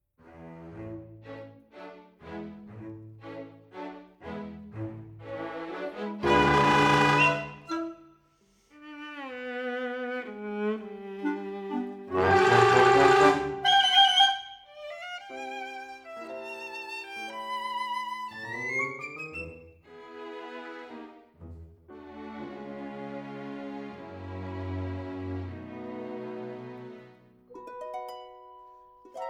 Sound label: Animation orchestal light farce